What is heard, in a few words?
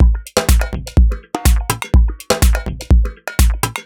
E 124bpm, House Drumloops; Zero-G House Fabrik Drumloops